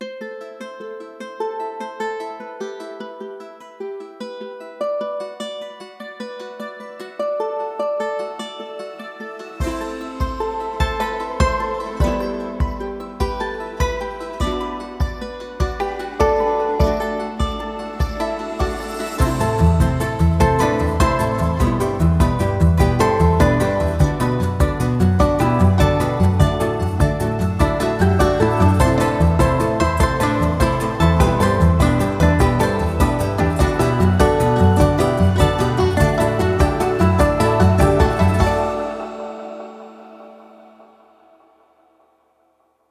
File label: south america strings; south america series; tribe instruments